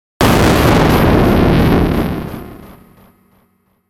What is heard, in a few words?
Fuzz Click Shine; Warble; Blastwave